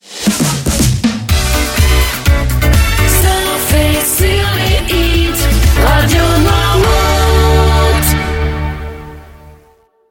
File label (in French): Jingle Chante, Radio; court; Radio Noirmout